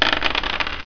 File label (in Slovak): Hod kockou; Revelation Sight and Sound SK